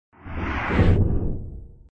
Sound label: Wall Move; Bob the Robber 2